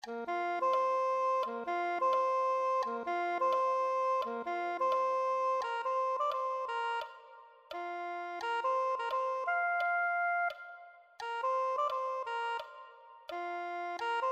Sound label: soprano saxophone